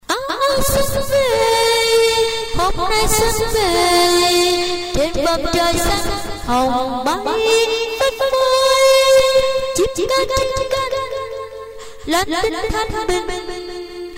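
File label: soprano alto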